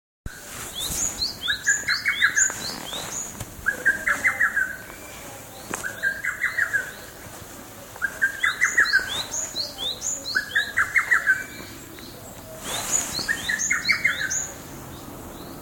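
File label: hototogisu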